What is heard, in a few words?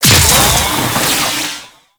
Strike Cast; Warframe; Captain Vor; Abilities Redux